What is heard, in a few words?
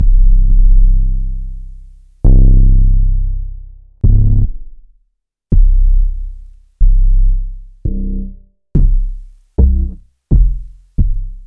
Bass c